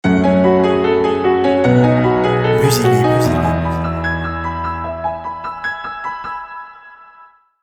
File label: classic piano